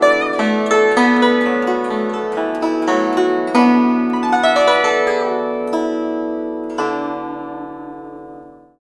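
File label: guzheng; Spectrasonics; Heart of Asia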